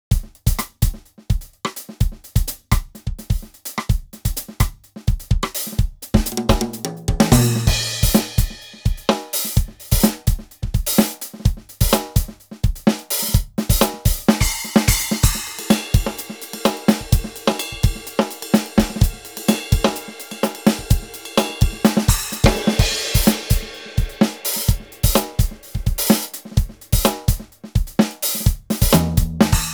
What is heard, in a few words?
splash; efnote 3